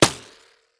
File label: Bullet Wizz, ricochet; tacz-tweak-pack; taczmechanics